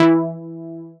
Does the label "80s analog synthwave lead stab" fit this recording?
Yes